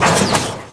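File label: suspension, car; Cars; XBOX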